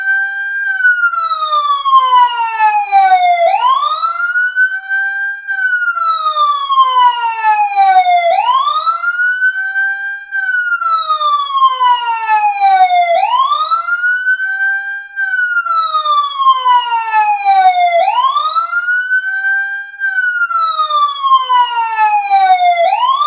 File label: emergency siren close long